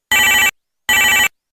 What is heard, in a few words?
grass, step; LumiCu 2.0; minecraft